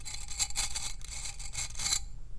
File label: Big Metal Brush, toolbox rummaging